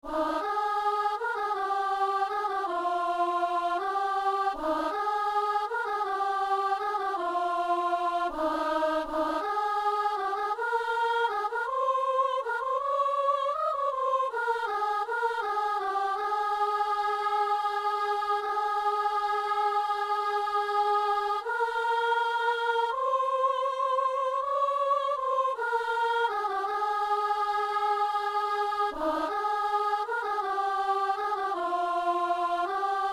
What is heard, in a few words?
soprano